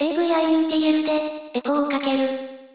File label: echo; Audacity